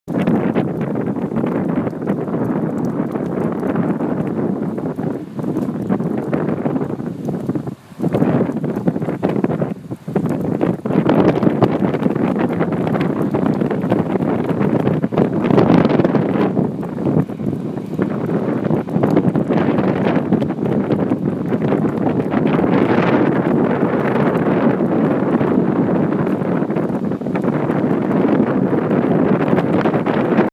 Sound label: Wind And Rain; Location: NYC, 55th Street